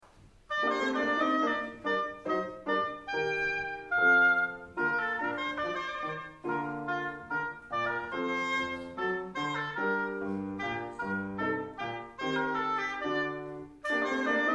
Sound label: gavotte, concert; live au vox; telemann